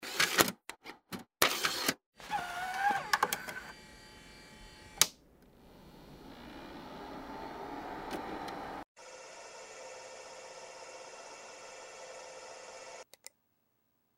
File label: essential computer sound